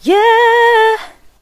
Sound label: "yeah", sung